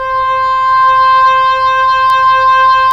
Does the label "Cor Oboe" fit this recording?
Yes